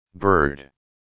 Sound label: bird